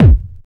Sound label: bassy kick drum f sharp key, Loudest frequency: 168Hz